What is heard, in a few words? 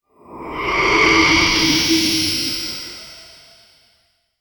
enemy ghost phantom vanish, magic